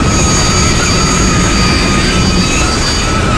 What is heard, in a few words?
grind tank turret, terrains; Tony Hawk's Project 8; XBOX